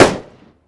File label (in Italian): armi